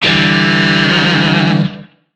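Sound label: Shawzin Major Chord; Warframe; Lizzie Shawzin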